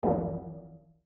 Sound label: bump outside ship; Lethal Company